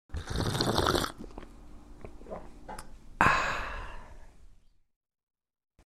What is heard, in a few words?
sip ahh